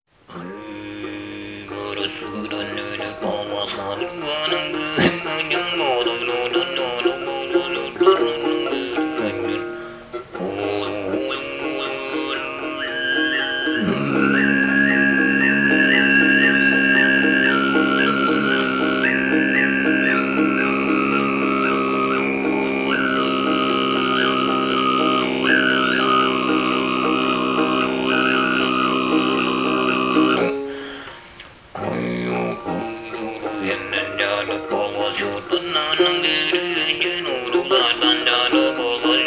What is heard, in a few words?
Tuva Chilangyt